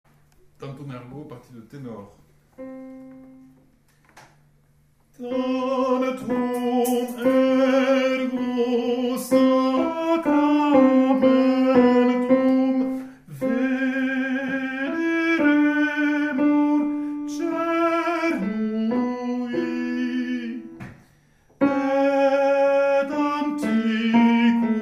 Tenors; Tantum Ergo Sévérac

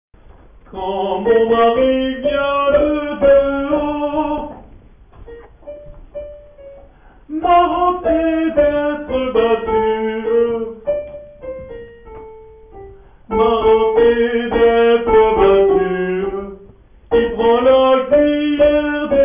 Quand mon mari Soprani

